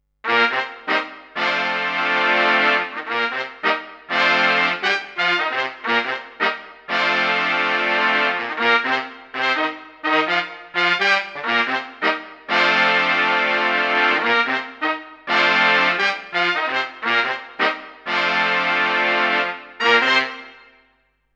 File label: Brass Tape; VIVO S10